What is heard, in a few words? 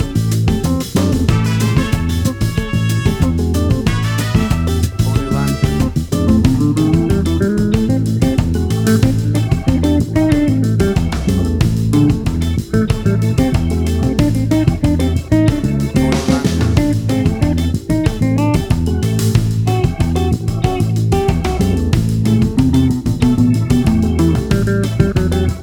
Acid Jazz Fusion Groove